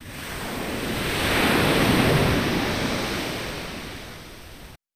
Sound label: wave synth